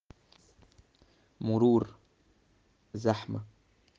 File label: traffic